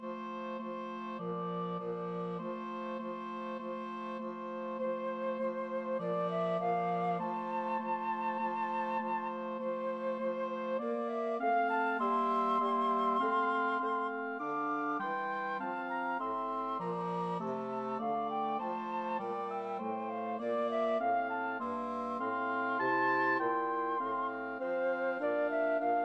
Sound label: flexible mixed ensemble 4 players